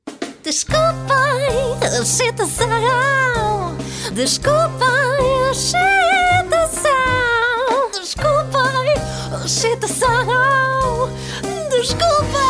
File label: Sing